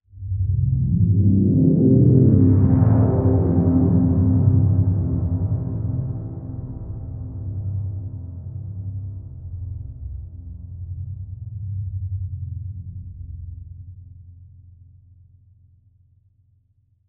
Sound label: Pad, PsyTrance